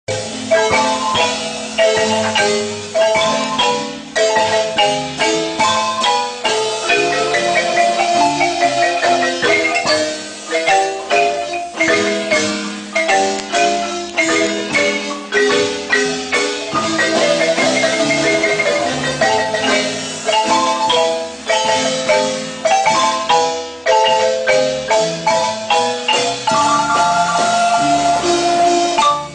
Marimba Band in Antigua Guatemala

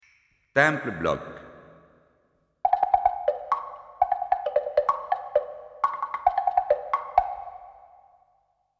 Temple block

